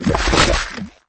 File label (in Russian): razdavili yajco rukoj